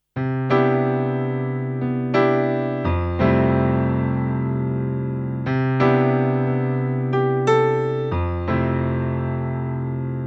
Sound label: Korg i3 Grand Piano